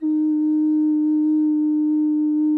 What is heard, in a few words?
TEN D#1, GEMSHORN; SoundBytes - Early Patches